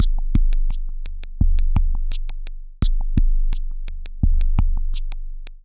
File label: Electronica Drumloop; Drums